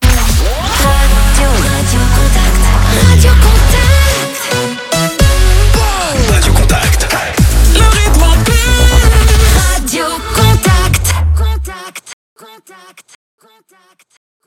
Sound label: JINGLE; RADIOCONTACT